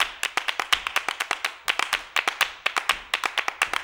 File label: MULTCLAP, MULTICLAPS; Best Service; World Colours